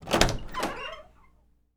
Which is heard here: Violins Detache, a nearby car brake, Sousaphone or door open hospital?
door open hospital